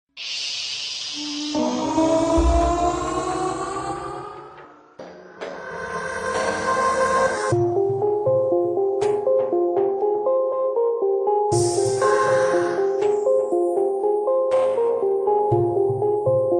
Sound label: Instrumental Music